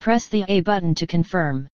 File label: menu; DeadFright